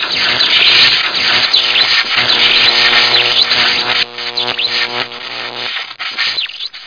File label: Sparks